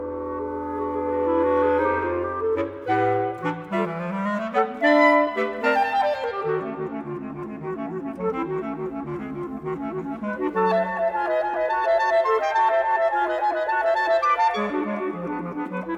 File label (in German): Quartett Molto Vivo, klarinettenquartett; Willy Mortier; Quartett Nr.1